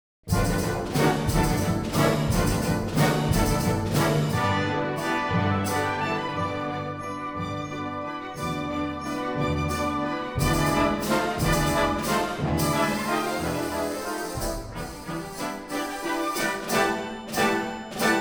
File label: Valses nobles et sentimentales (Version for Orchestra) Modere